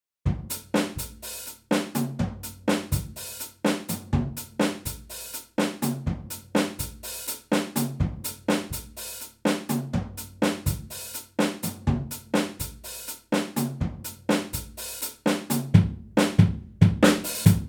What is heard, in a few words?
drum rack; soundwave